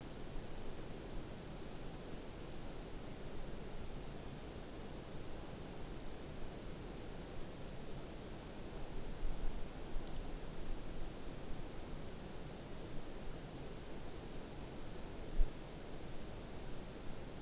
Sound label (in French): Bécasse des bois croûle; Issaux